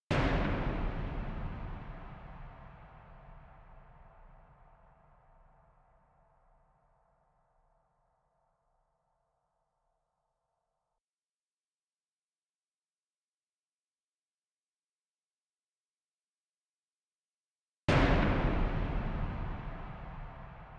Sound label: thunder hit; silence